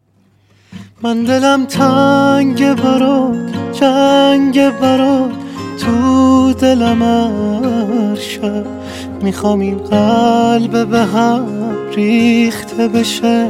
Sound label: Acoustic Version